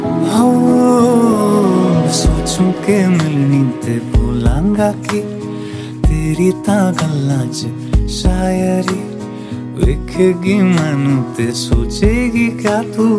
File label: song ringtone